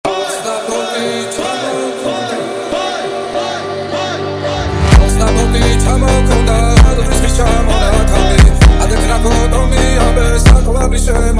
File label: Funk, Georgian Folk